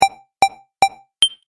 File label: Race Countdown Beeps Fast; Beep Sound Effects